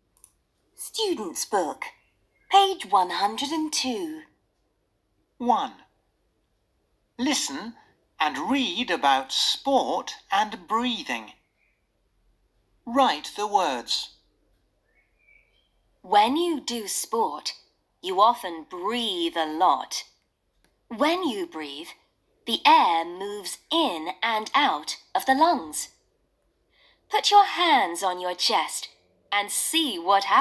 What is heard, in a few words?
Breathing